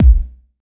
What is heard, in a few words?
hot kick drum single shot b key, Royality free bass drum sample tuned to the B note